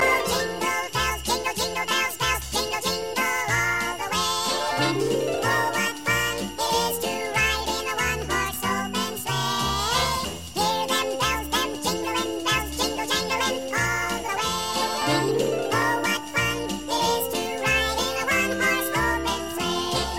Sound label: Jingle Bells